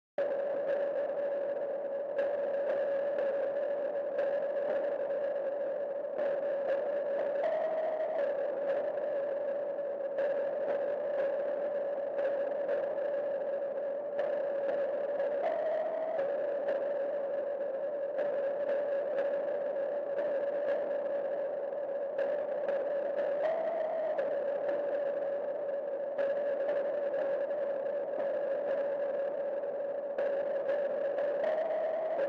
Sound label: NOISE